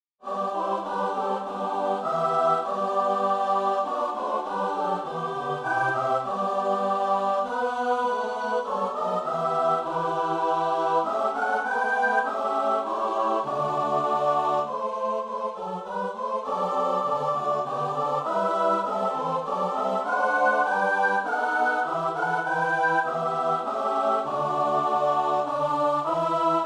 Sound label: midi; aniolow chory; Biblioteka Utworów Chóralnych przygotowana przez Towarzystwo Muzyczne im. Edwina Kowalika